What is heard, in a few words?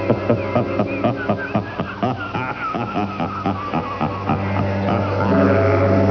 laugh; SSB